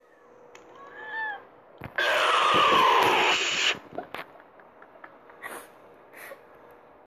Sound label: OOOOOOOOOOOOOOOOOF